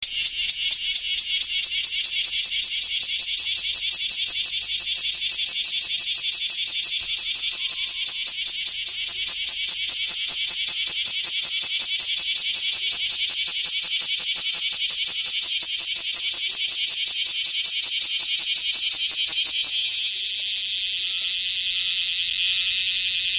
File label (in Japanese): kumazemi